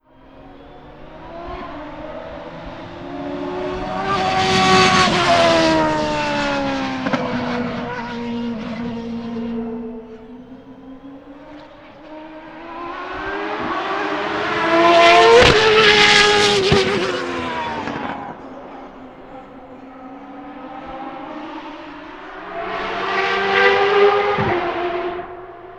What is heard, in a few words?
Race Car S Curve Bys; Vehicles